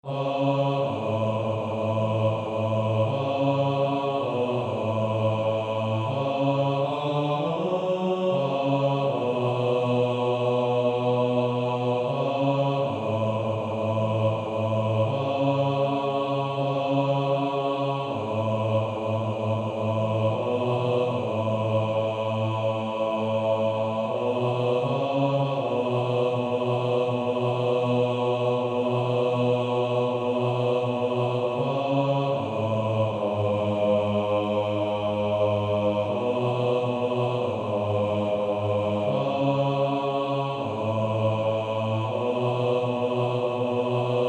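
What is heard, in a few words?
Male Choir, bass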